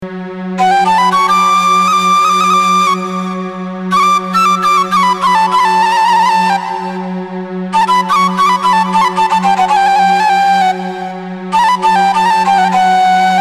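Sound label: flute x, melody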